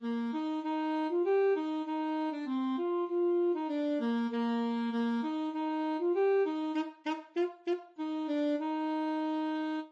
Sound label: muffin man, saxophone